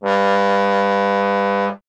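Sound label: bass trombone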